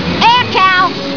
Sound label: air cow